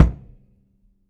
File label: Prepared Drums; Sonic Foundry (Sony Creative Software); The Electronic Music Manuscript Collection; Battery 3 Sample Kits